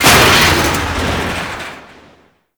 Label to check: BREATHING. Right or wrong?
Wrong